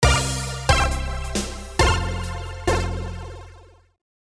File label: Level Start; Giana Sisters 2D; Android; Windows